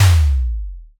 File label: bass drop